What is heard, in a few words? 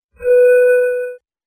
Pan Pipe third 5-4-1; music